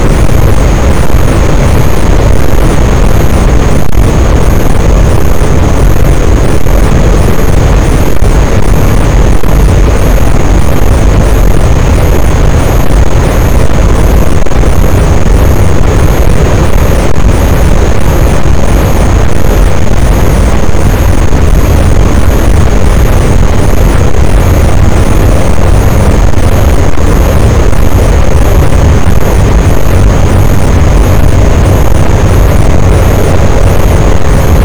cachalot, boat; Physeter Macrocephalus

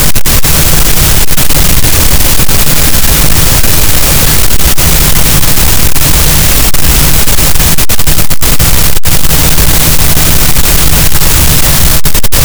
cachalot, gaussian noise; Physeter Macrocephalus

